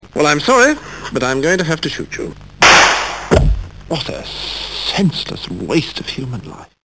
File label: shoot